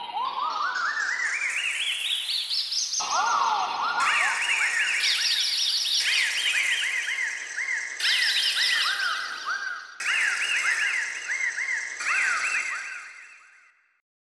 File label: Bird Tweet; EverQuest